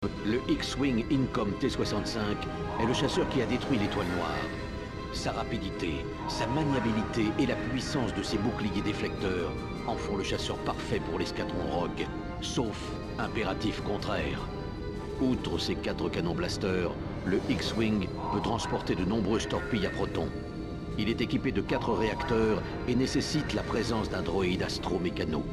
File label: X-Wing; Star Wars